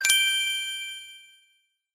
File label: sweet soft sms ringtone